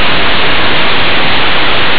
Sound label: noise